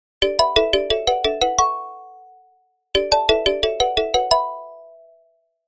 OnePlus Mobile

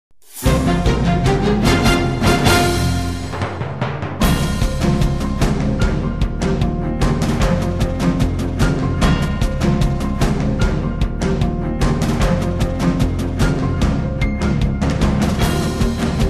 Breaking News; MSNBC

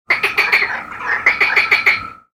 sounds of frogs at night

